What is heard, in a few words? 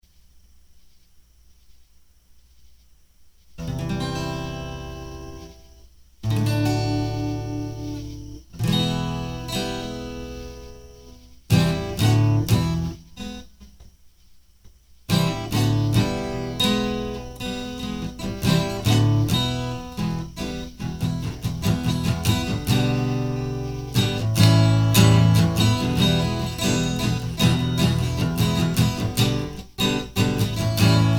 Playing For The Crickets; hyper terrestrial